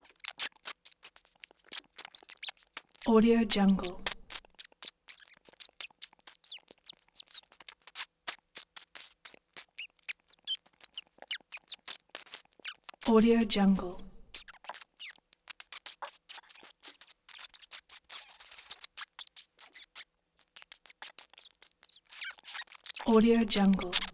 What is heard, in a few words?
mouse vocal excited chirpy kiss like squeaks